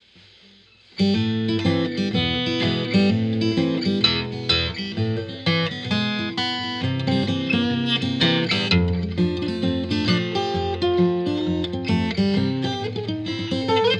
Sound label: martin 000 16gt natural